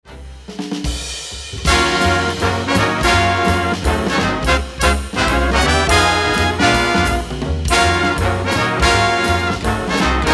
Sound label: the e string sample